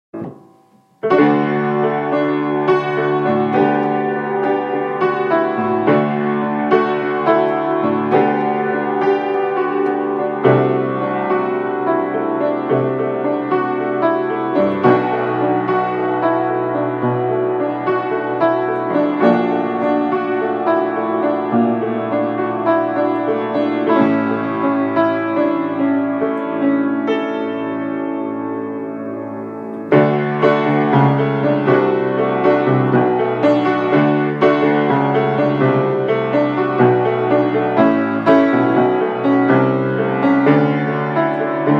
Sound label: piano song idea; Broadway